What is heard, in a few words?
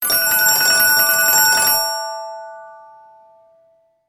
Brass, ringtones